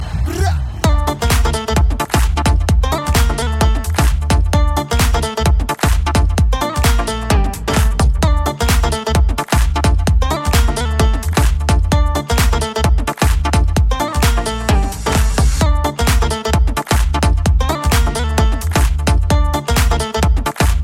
House Mix

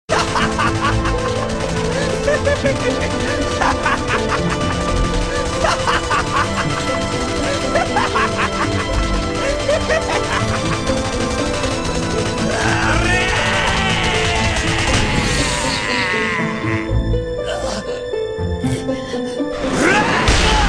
Laughs; Grimmjow

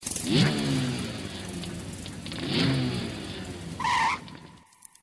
Bike Sound Message

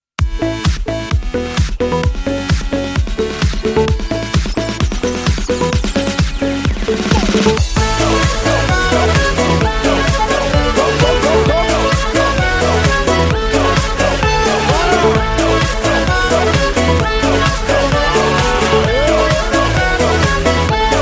best awesome music

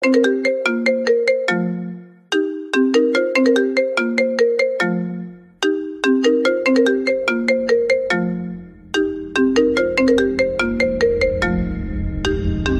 iPhone trap remix

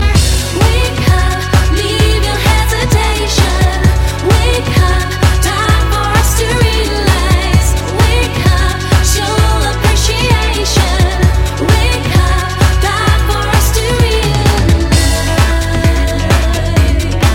Calling

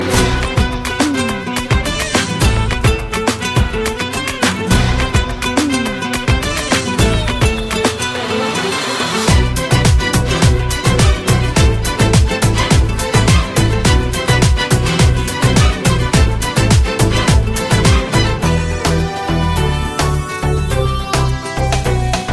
Turkish Oynada

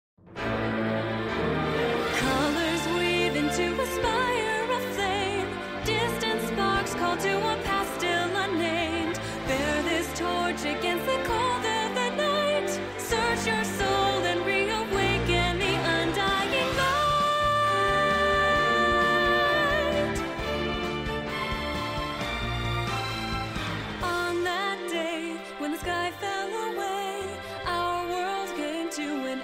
music

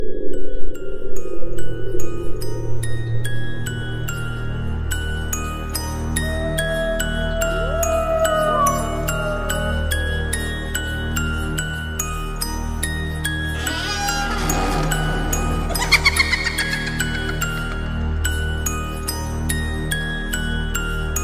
scary; hallowen

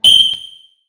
Fire Alarm

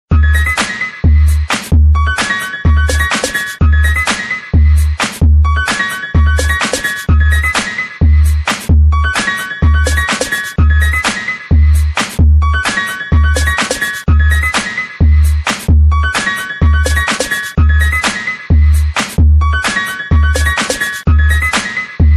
New Step Up Beat

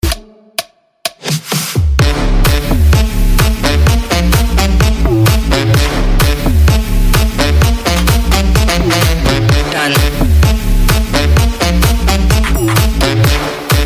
Bassline

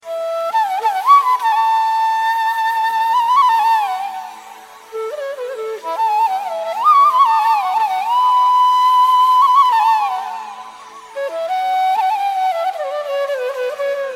Flute In Romance

